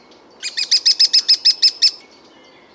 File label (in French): pic epeichette; faune